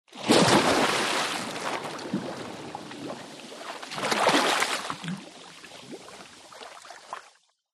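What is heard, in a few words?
Splash Large Dive Water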